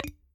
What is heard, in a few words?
Unlock; ZUI 13